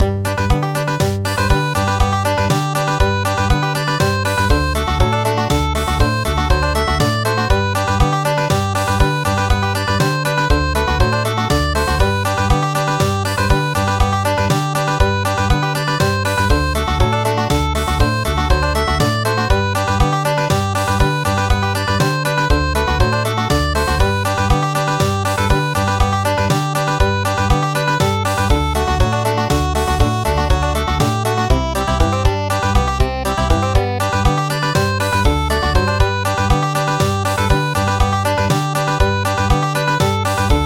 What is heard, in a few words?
Country